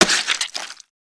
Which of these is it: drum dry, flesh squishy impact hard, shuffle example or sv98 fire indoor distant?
flesh squishy impact hard